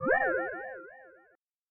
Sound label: Portal Spawn